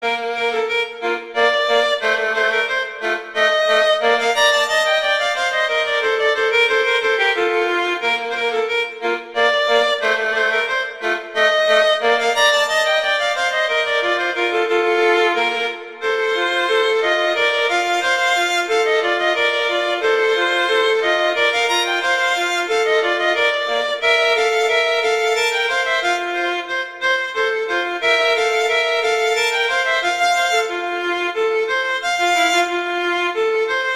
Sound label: Duet; Mozart Duets